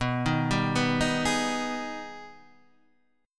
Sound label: Minor Major 7th; Gsharp; B; 7,6,5,4,4,4